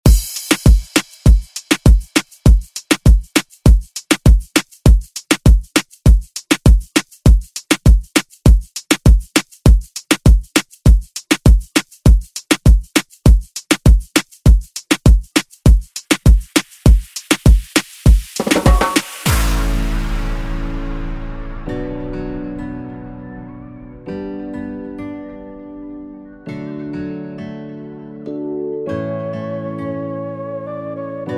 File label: Re Drum; DJ Mhark